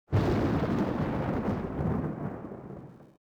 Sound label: thunder; Soldier Of Fortune II Double Helix; XBOX; ambience